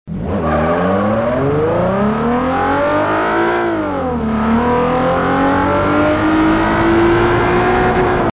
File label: first, vehicles; 350z; TDMCarpack; TDMCars